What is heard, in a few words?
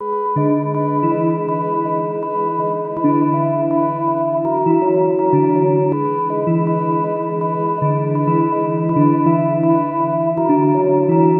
flyover beat